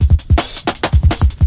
162 bpm dark skip, drum loop; 161 to 180 bpm

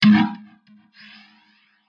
Alien Snare, DrumKit; REAL LIVE KITS; Osama Qureshi DrumKit

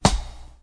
snare clock; single hits

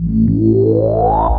Bubble Bass Sweep; Basses

